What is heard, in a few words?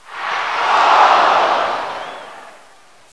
Shoop Close Call; Intel; Createshare; PC Camera Games